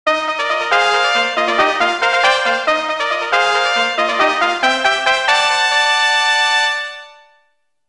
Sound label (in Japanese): もっとヤル気のファンファーレ; monster farm 1 2 complete sound collection